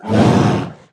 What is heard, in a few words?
Polar Bear Warning; minecraft